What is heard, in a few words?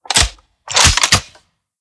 Reload; blue archive